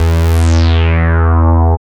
BASS, Tekno Industrial; East West

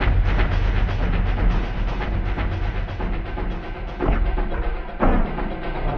Elektro Choo Choo, Drums; Sonic Foundry (Sony Creative Software); Friendly Fire

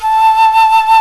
GUITAR FLUTE

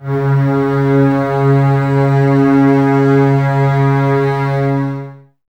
Basses C#3, String Ensembles; Miroslav Vitous; 90 s Sample CDs

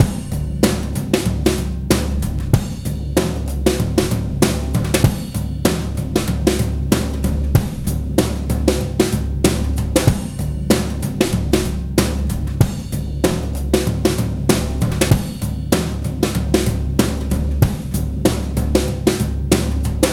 DOOM BOOM 95.2 bpm; Planet of the Breaks

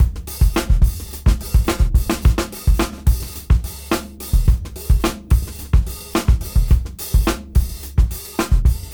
Junky Funk 107 BPM

